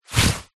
foley clothes running shorts polyester single leg move walk movement